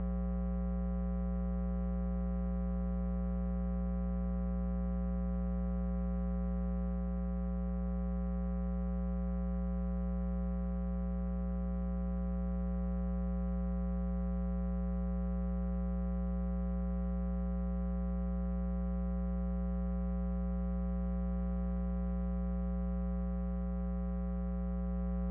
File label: Amp Guitar Bass Amplifier Typical Ground Hum